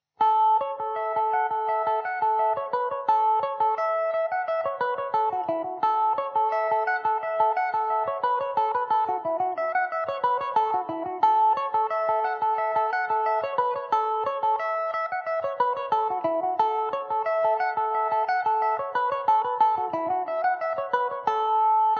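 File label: A major mandobird, irish mandolin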